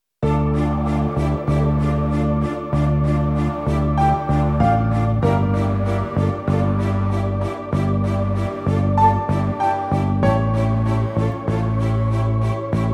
instrumental

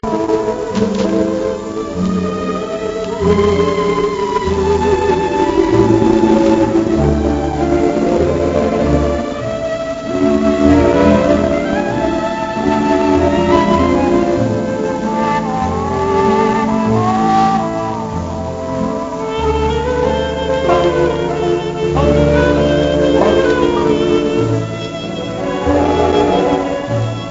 instrumental

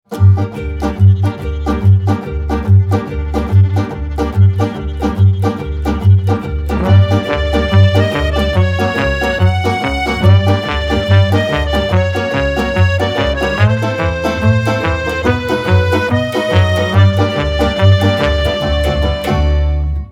tarantella

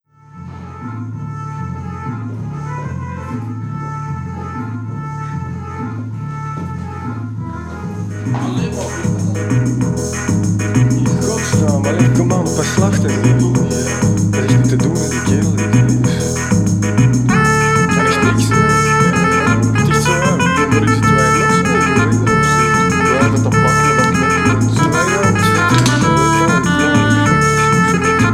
magic organ; Belgium; World,balkan brass,klezmer,jazz

